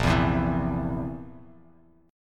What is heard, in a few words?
A sharp Suspended 2nd Flat 5th A sharp, Bass Standard; strummed; A#sus2b5; JGuitar